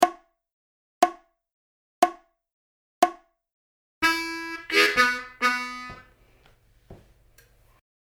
12 Bar Country Style Blues Turnaround 60BPM, harmonica